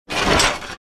Battle axe scrapes against metal shield

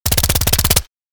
Thompson submachine gun short burst; war

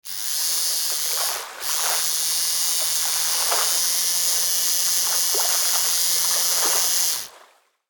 Toy boat in water remote controlled; rc toys sound effects

